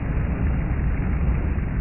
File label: refining; zrush